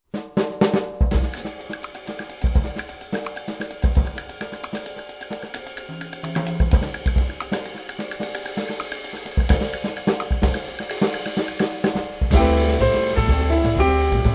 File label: Samba